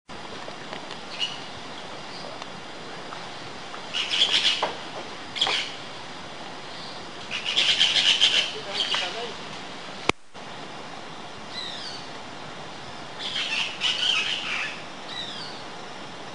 short tailed parrot

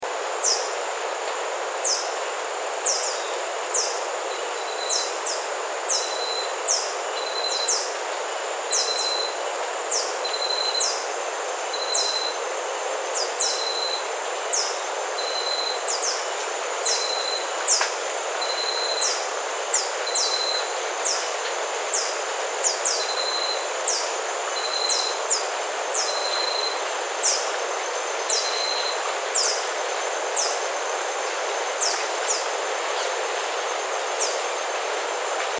grey and gold tanager

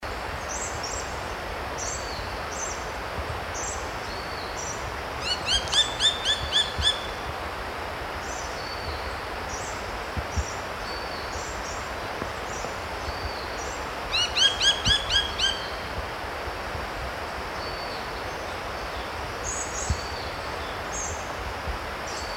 yellow browed melidectes, bird sounds calls

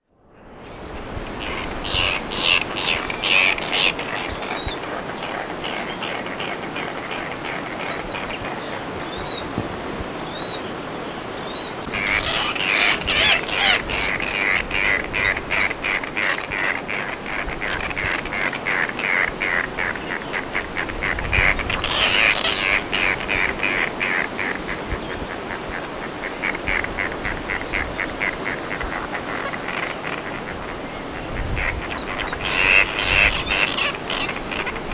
white tern, bird sounds calls

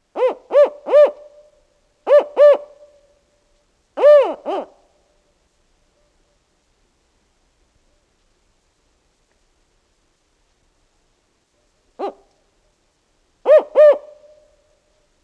spotted owl

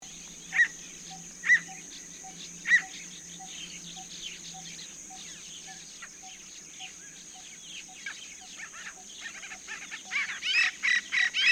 swamp francolin, bird sounds calls

